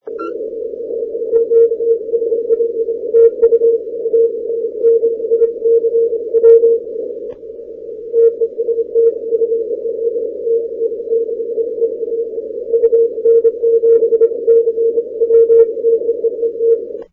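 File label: 80m long path; 80m DXCC Entities worked from 2002-2008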